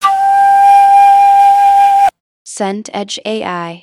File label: flute breathy note